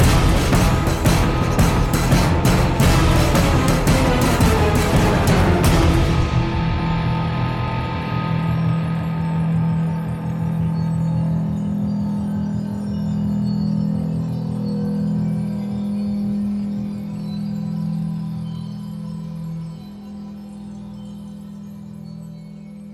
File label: Battle Kill; Infinity Blade 3